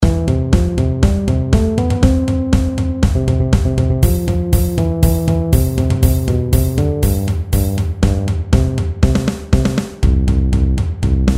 durm patern

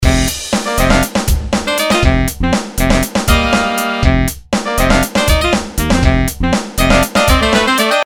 Brass section

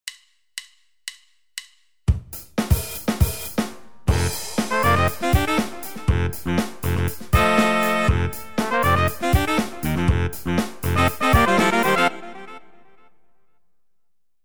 funk horn

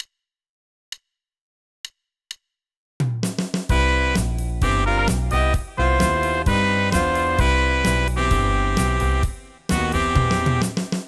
Jazz horn

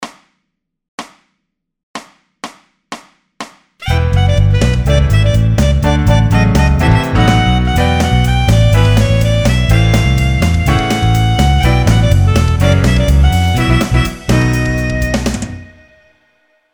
Motown R&B

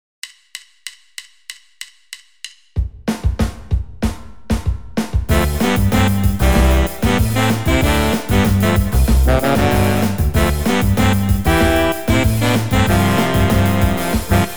Latin Horn

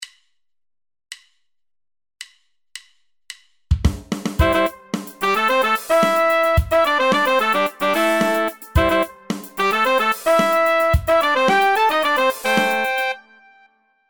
Horn Section

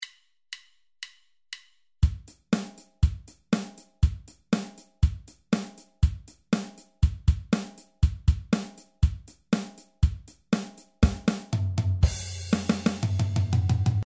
Rock & roll

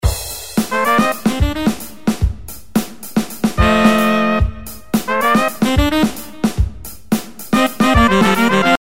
Funk Horn Section

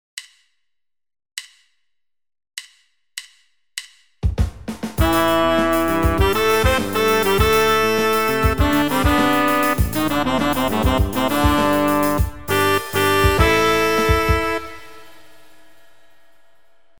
Pops Horn

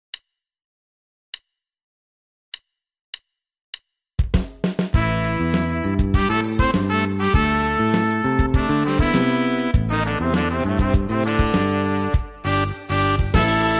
Pop Horn Selection

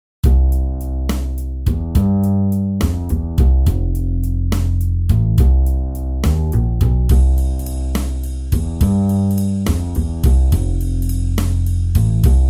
Blues

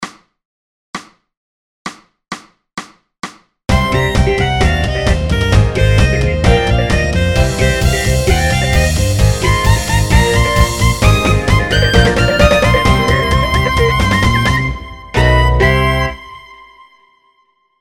Pop n Rock

